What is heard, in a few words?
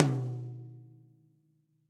Tom Mid Cop Style, Drum Hits; Standard Hits; Ableton; Factory Packs; Session Drums Studio